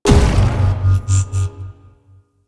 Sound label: Boost Gravity Well Start; Warframe; Nineteeen Ninety Nine; PvPvE